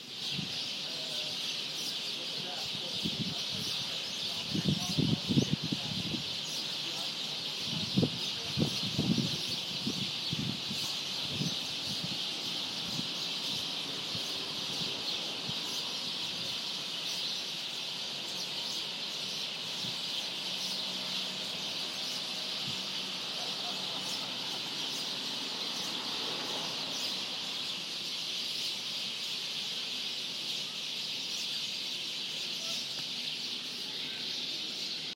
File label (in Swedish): Småfåglar i ett träd Cypern